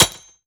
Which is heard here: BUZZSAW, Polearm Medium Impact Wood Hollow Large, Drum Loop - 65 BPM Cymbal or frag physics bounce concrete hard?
frag physics bounce concrete hard